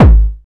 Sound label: Bd Hard 909, Kick Drums; Zero-G; Soundclash